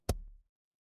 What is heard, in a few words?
ui pop